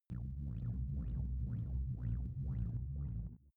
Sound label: space dark shuttle; raumschiff